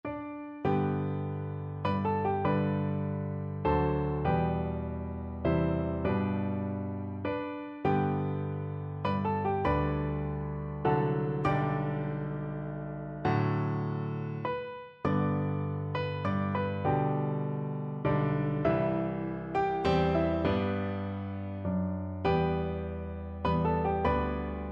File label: piano, piano duet